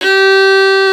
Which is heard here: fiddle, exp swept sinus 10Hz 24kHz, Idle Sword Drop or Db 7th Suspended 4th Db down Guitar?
fiddle